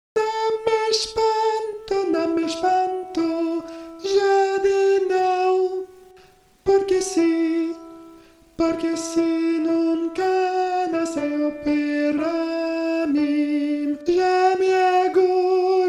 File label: soprano; nam m espanto